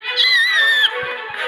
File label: cute squeal